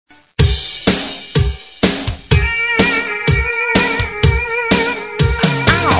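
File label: loop, ringtones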